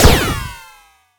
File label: Laser